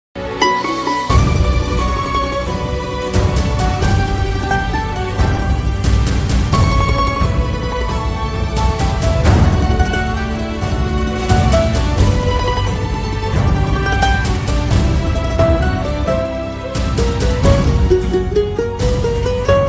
instrumental ringtone hindi instrumental love ringtone